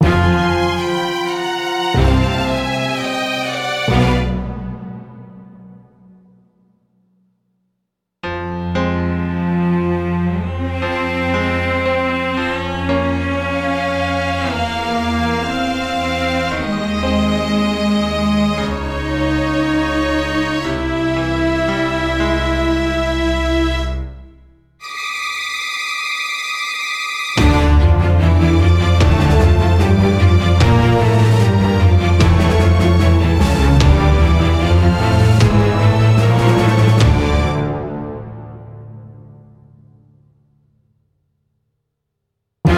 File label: Outro Music; super meat boy 2015 ps vita